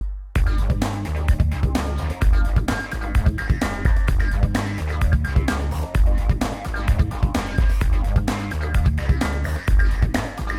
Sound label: level; DragRacing